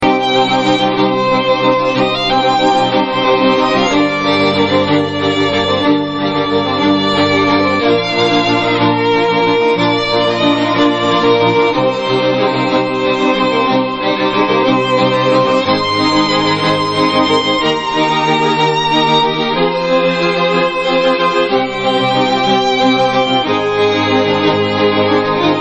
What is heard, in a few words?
violin cover